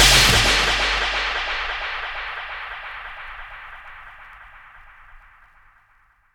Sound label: FX Downlifter